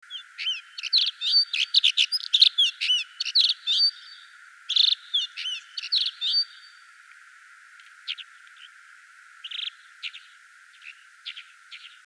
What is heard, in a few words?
Alpine Accentor Prunella collaris